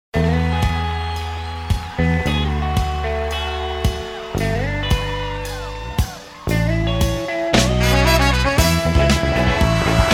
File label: Tap